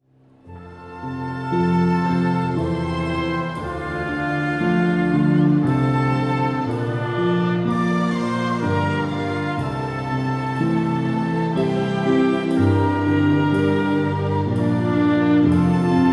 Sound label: Intermezzo Piano e Cordas